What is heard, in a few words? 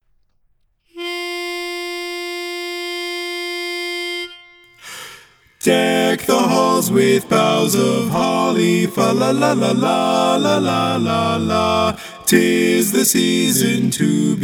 Deck the Halls tenor missing; Christmas